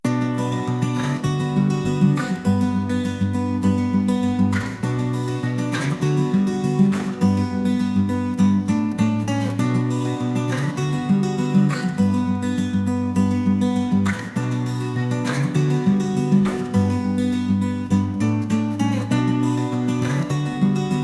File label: Acoustic Punk, instrumental punk